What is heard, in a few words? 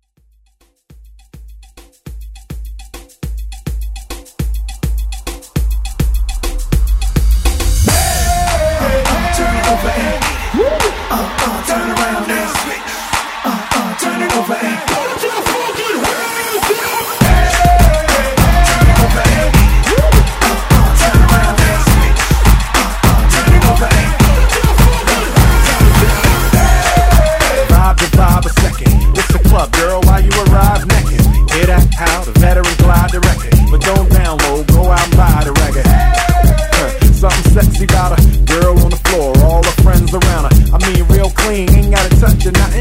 Throwback Redrum Hype